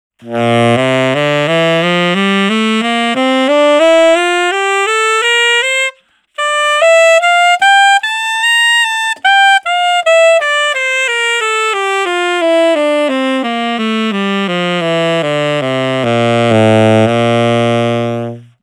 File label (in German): smooth volume tonleiter